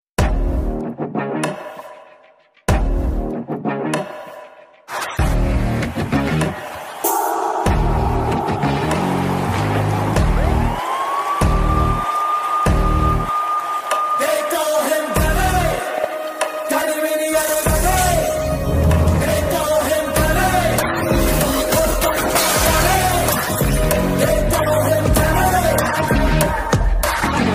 bgm telugu movies bgm ringtones music mass bgm; Ghani

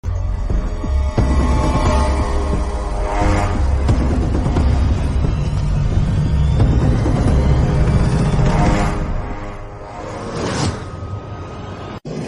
bgm; alludu adhurs movie

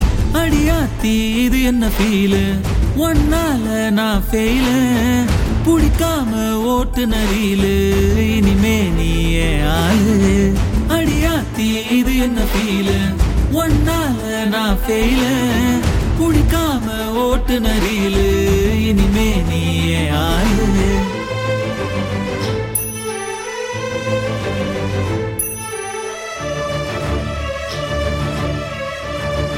Reprise Version Humming Ringtone

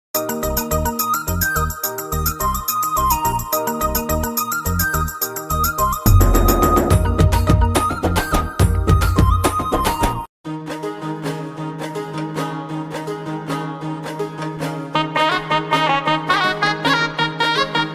FOLK SONG; Telugu Private Songs

